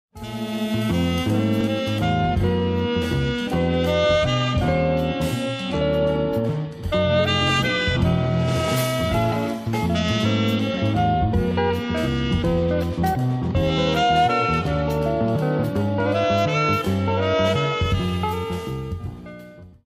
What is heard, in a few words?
waltz